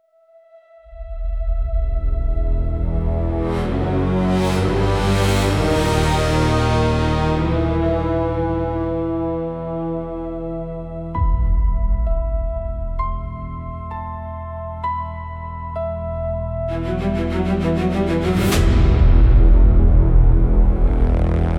soundtrack streaming loud; transientik master; transientik labs

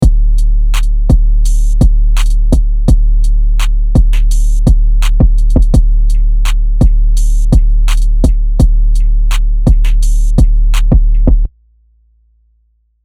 trap drum kit 808 rumble; iota mini; angelicvibes

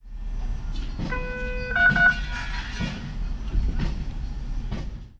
scrapyard scale weight; nova scrap yard; junk yard metallic and impact fx; nova sound

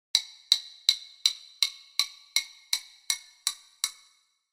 vital presets; chiqui audio; vital fx presets

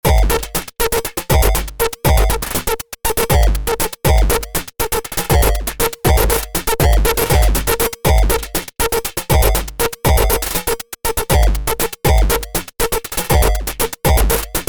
drums

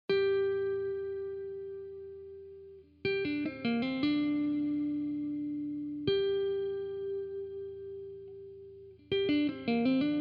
les paul wet; chiqui audio

